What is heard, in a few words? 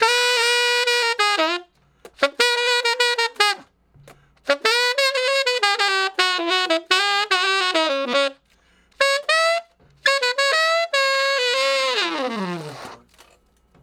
Sax Shuffle (Db); Crimson Blue and Fabulous Horncraft 4 RnB; Horncraft for R&B; Solos; Ten Sax